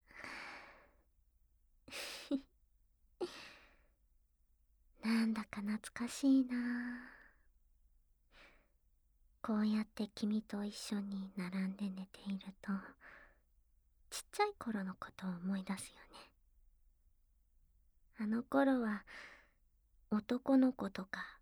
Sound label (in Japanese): 昔みたいに一緒に添い寝。耳ハム耳舐めキス, ASMR